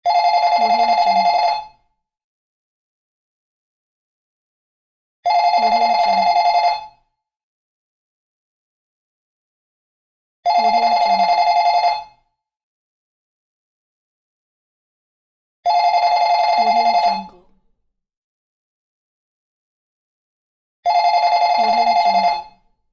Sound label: telephone ring loop